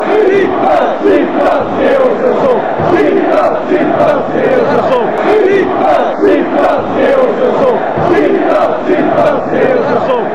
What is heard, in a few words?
chant, djurgardens; djurgardens if